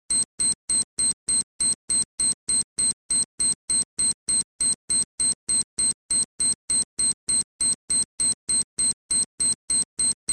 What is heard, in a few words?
c4 beep